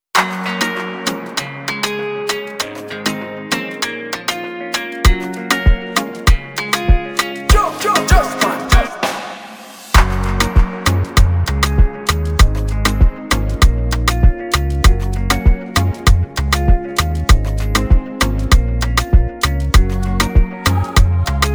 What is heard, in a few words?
instrumental; Uganda